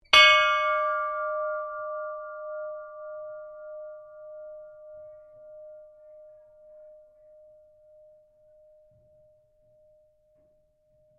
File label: SHIPS BELL IRON RING; Flinders; Various ships bells; Boats; Vehicles